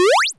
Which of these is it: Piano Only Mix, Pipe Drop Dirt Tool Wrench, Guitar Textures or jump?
jump